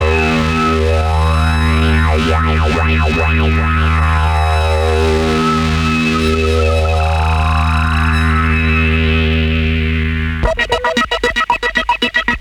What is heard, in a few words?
beatscapes